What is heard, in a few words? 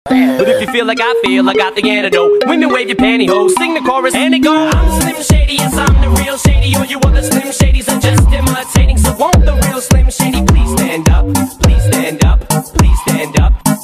Remix; klingelton